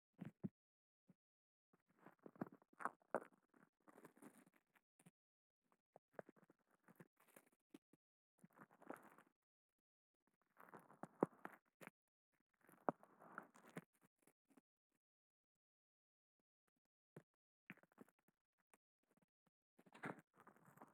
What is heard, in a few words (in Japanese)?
お耳ぺたぺたマッサージ, ASMR